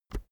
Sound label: keyboard external enter push